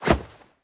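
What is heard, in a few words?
throw, grenade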